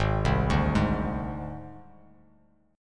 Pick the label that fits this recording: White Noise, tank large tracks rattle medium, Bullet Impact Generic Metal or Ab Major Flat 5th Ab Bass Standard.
Ab Major Flat 5th Ab Bass Standard